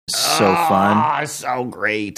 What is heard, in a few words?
ahhhhhhhgh; so fun; so great